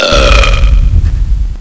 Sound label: big burp; nz